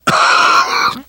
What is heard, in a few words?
death scream, survivor voice